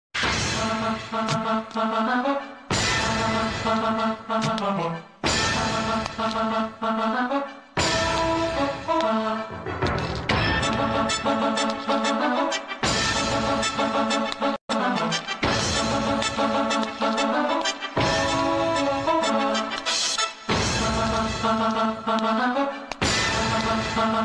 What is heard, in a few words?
Malayalam movie mass bgm, villain bgm; FIR; Narendra Shetty